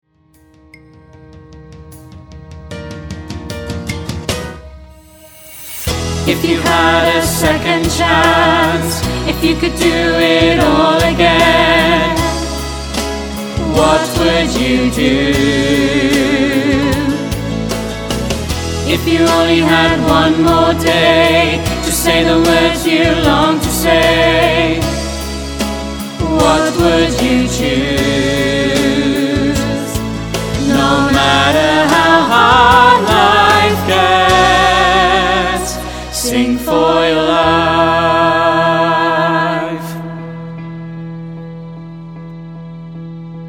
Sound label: semi chorus sing